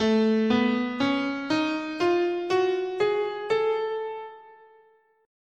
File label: A Lydian Augmented